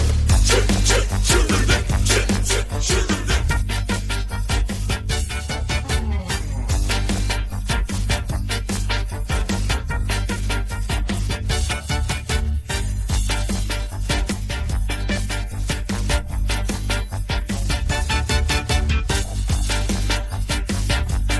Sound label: music